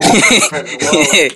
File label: laugh